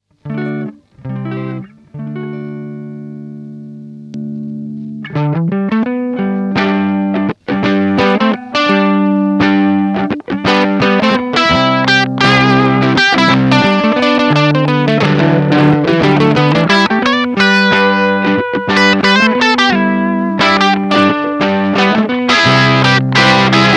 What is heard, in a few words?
Grunter; circuit demos